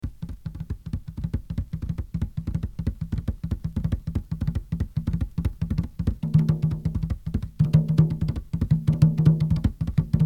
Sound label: African Drums; KPM 1000 series